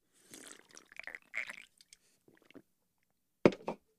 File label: Beer Slurps Mug Down, eating & drinking